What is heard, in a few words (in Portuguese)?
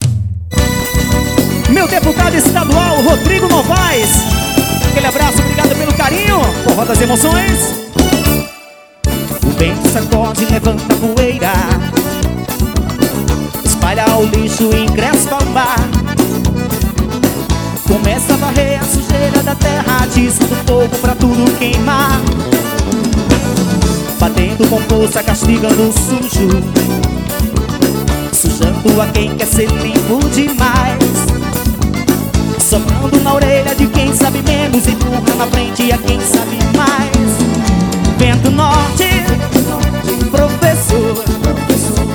forro, ao vivo; em mauriti